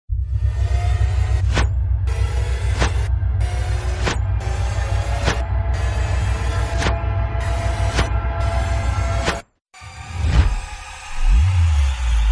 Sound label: Malayalam tune ringtone